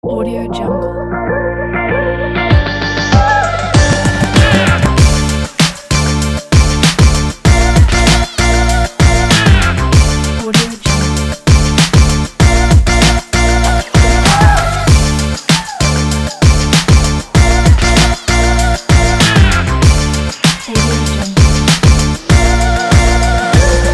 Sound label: upbeat vlog hiphop